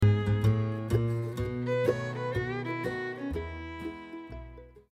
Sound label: I Am a Pilgrim, Mandolin Hymns